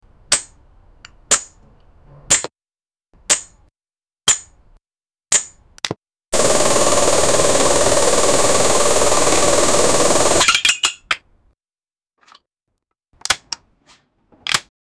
5 gun

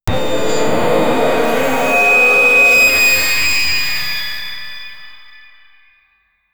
video game, death

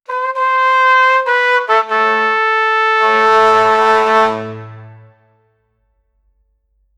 regal kakaki, africa

